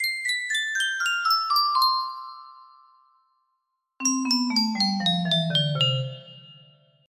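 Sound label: C major high, music box melody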